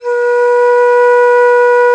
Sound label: ALTO BON, India Winds; E-MU Producer Series Vol. 7 – Old World Instruments